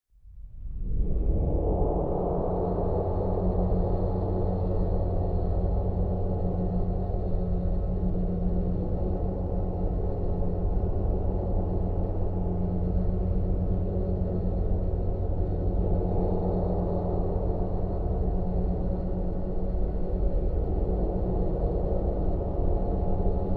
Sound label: tone